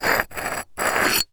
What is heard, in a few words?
Foley Rock Cinder Block Pieces Rub Together